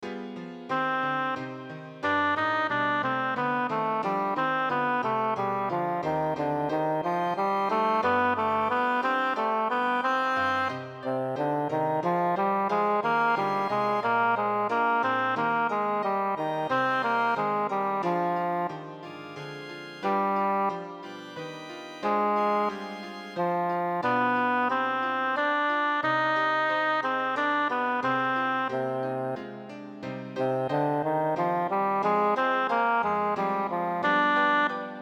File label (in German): aria con coro ohne chorstimmen; bach johann sebastian; johannes passion; 48 aria con coro eilt ihr